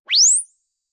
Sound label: shimmer style, airy